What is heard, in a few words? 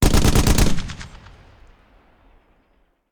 Machine Gun; Sound Ideas; Guns